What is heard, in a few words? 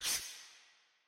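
muffled steel clang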